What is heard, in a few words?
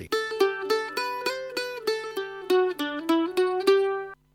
Mandolin Solo Track